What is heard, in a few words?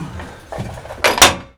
Vehicle reloads; Red Orchestra: Ostfront 41-45